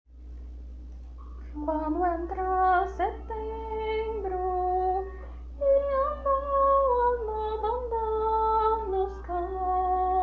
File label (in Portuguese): soprano